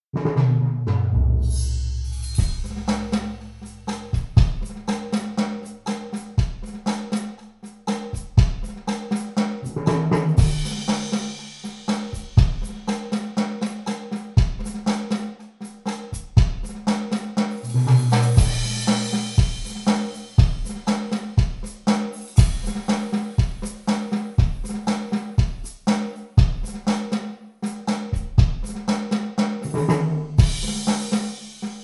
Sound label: beach house snares off rod (drums only); tascam